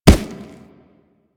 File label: Kubrow Run Dirt, Footsteps; Enemies; Warframe